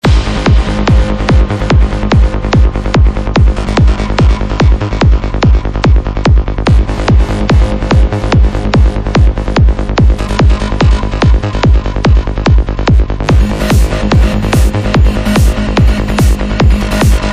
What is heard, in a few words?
Digital Bass Alien Attack